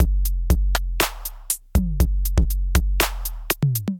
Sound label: ER1 Kit, Drum Machines; ER1 Powderhouse Kit; Ableton; Factory Packs; Drum Essentials